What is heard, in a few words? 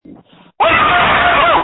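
Scream, phone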